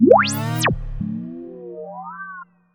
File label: TRAP TRANSITIONZ